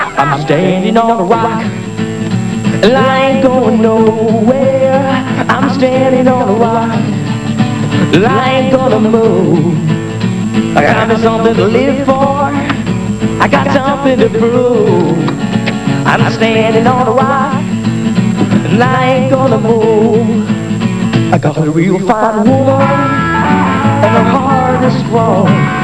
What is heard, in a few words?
rock